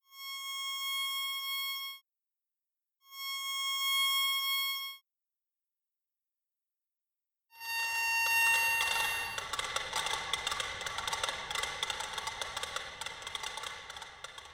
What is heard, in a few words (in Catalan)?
herba formiga placa